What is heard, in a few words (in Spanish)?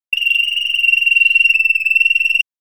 ritmo continuo